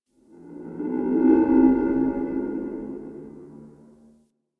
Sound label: interior cave tonal drones; Ambience; Misc Indoor; DE; Warframe; Infested Eris